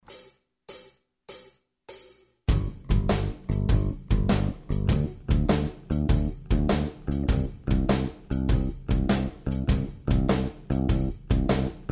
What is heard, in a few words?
8 bar no guitar, blues